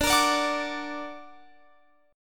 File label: D Augmented D down Guitar Open F; D+ strummed; JGuitar